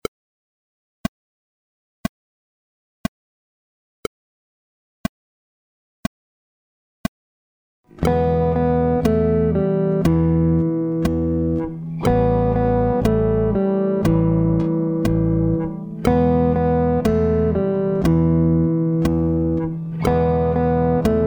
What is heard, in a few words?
guit